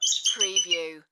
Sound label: American Bald Eagle squawk staccato